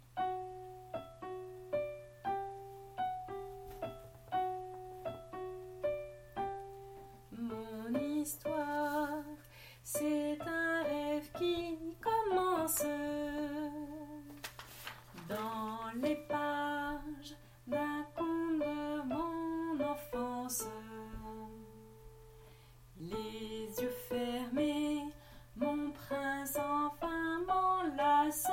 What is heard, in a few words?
alto